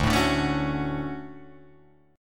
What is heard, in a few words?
D Major 7th, Guitar, Drop D